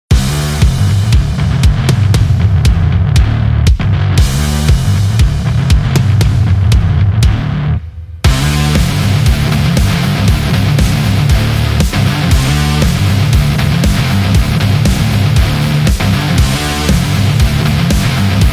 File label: Rock Sport; gym rock